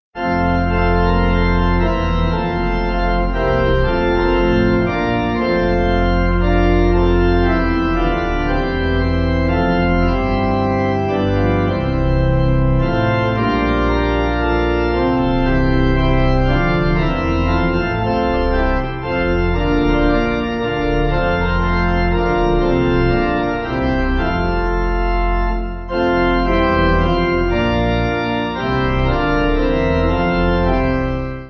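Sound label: Pipe, hymn backing; My Heart Is Full Of Christ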